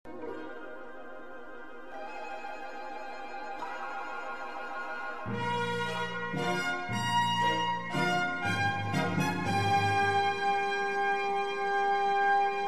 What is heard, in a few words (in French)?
indicatif radio alger